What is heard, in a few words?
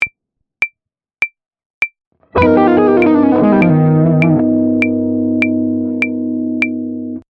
Lick